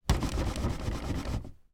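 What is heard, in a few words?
household rickety old cot bed wobble fast movements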